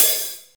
shiny open high hat d sharp key, Royality free open hat sample tuned to the D# note